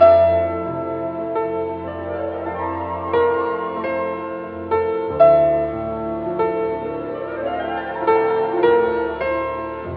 piano wistful, sad loop; loops

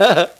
ha ha

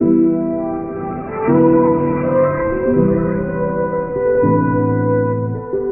keys, Loops, Sad

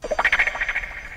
guitar wocka

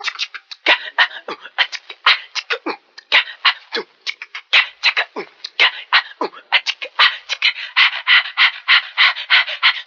lady chants, Beatbox, Drum loops

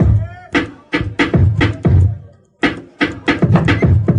scratch beat; Drum loops

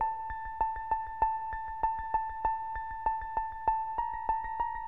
keys tense, sad loops

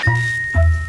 two plinks, Stabs

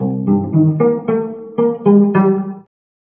bass

